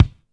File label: clean kick sound f key, Loudest frequency: 327Hz; kick drum samples